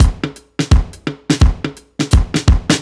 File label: Kit Heavy Swing, 85bpm; off the grid